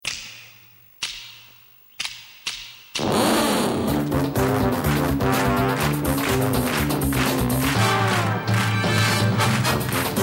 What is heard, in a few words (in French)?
Generique tv